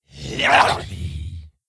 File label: Attack; General Vezax